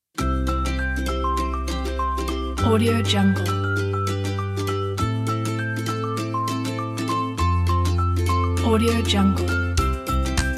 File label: cute cartoon